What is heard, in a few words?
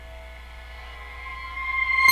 FARFISA ORGAN; Sonic Reality; Vintage Timetraveler